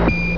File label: beep